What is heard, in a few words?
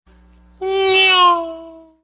nyoom